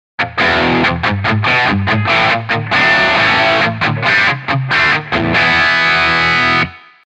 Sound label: guitar Cat